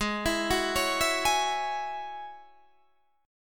A flat 7th Suspended 4th Sharp 5th A flat Guitar Standard, chord; Ab7sus4#5; 16,19,16,18,17,16; arpeggiated; chordshape; JGuitar